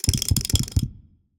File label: Enemy Boss Shaking